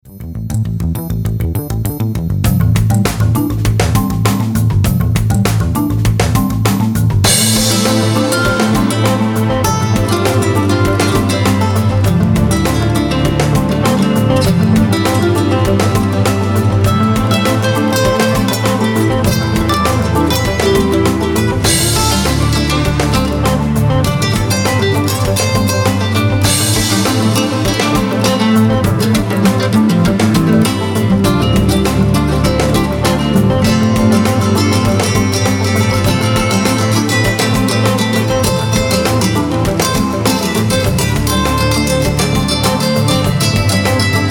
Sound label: Erhu solo excerpt